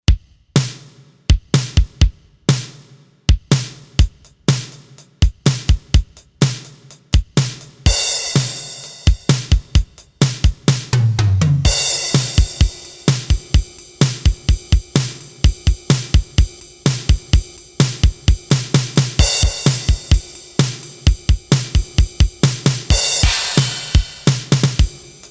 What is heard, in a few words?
STANDARD, Roland SC-880; Factory Tones